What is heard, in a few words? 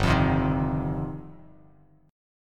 G 7th Suspended 4th Sharp 5th, Bass Standard; G7sus4#5 strummed